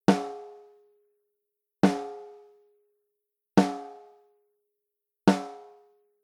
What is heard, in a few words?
API512C, preamps snare drum